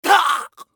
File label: scav death; eft